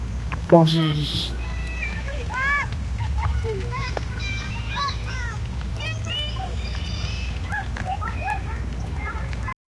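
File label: park of dreams ambience, kids laughing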